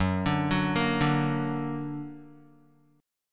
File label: Gb Augmented Guitar; Gb+ arpeggiated